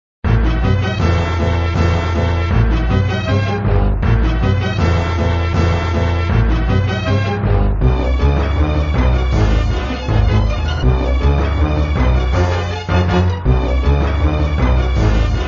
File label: Music, flipper; sttng